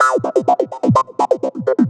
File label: 126 C MUSIC; Loopmasters; Funky Underground Vol 2